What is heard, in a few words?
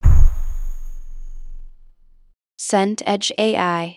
impact distant